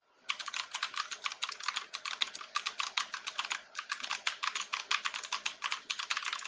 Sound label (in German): Tastatur